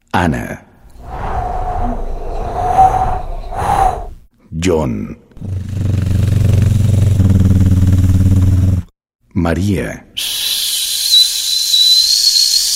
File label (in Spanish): Helicoptero